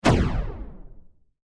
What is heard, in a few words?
Science Fiction Laser Cannon Single Shot Gun; Lasers